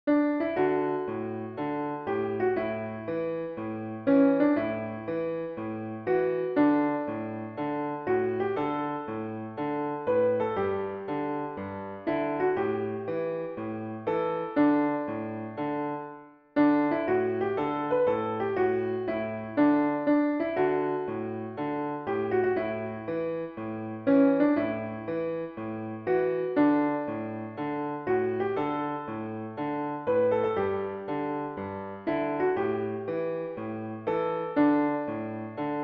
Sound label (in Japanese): こぶたぬきつねこ（ピアノ）